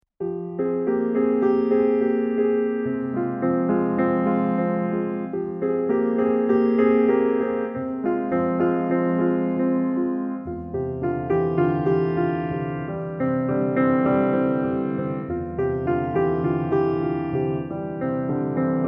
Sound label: Romantic Scenery piano solo